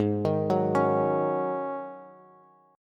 Ab 7th Suspended 4th down Bass, Ab7sus4 chord; arpeggiated

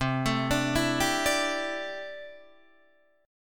C 6th Add 9th C down Guitar Open D

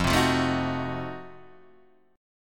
F 7th Flat 9th F down Guitar Half Step Down; F7b9

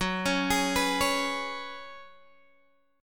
Gb Suspended 2nd Suspended 4th, Guitar, Open G; down; chord shape

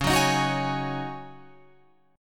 Db Suspended 2nd Suspended 4th Db Guitar Open F; strummed; 13,13,15,13,16,15

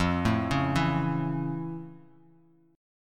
F Augmented Major 7th, Bass Standard; F+M7 arpeggiated; 13,12,11,9; JGuitar

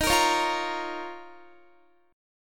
D sharp 7th Suspended 2nd, Guitar; D#7sus2; strummed

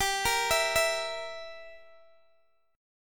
G Minor 6th, Guitar DADGAD

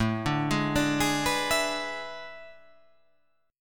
A Major 7th Suspended 2nd Suspended 4th

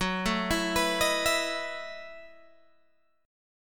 Gb Minor 13th Gb down Guitar Open G

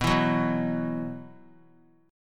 B Suspended 4th; Bsus4 strummed; JGuitar

